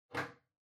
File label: TV Hardware Far HDMI Unplug